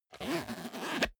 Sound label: zipper small case open